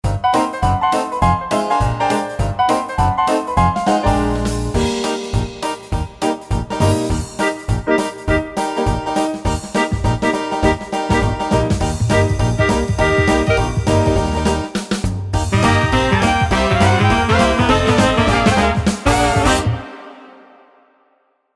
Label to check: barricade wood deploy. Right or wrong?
Wrong